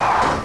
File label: Full stop; Revista CD Expert 42; funrun